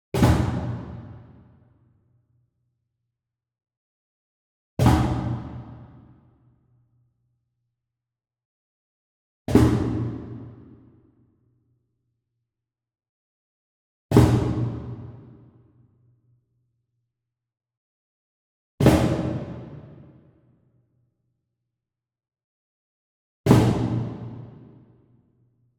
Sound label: Wood Percussion Cajon High Flam Slap Far; boom library; Cinematic Strikes Construction Kit